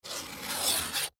Knife Cut Cardboard, Knife Cut Cardboard Single Stroke; Hand Tools; Foley; Blastwave